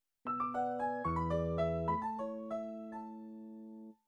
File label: nokia tune